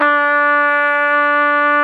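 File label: CORNET A4; AKAI S-Series CD-ROM Sound Library VOL-2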